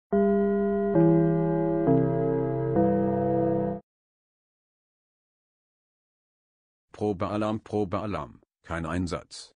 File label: probe alarm